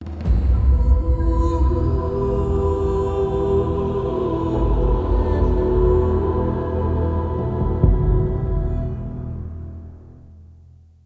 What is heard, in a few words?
UI Sound - UI BGM Area Text Rise; bless